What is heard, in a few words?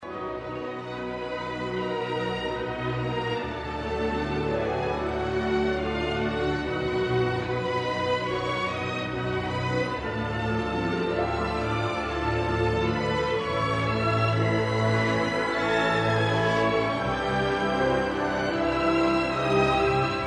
Symphony orchestra